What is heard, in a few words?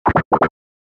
Beep And Bleep Sequence